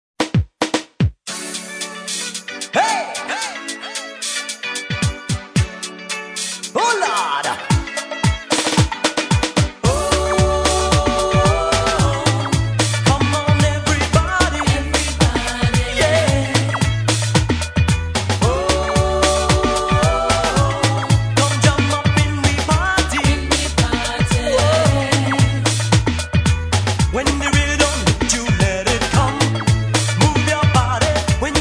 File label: groove